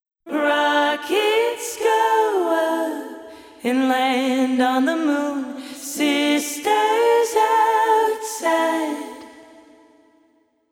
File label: Vocals Pro R 2 Modern